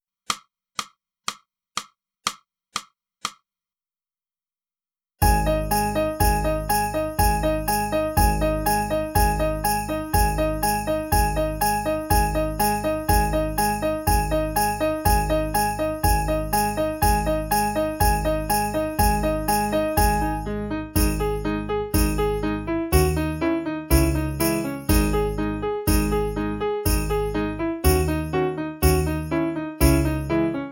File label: clarinet; (Morgan) - Boosey Woodwind Method: Clarinet (Book 2)